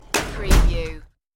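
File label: Shut File Cabinet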